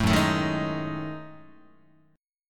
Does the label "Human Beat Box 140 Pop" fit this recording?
No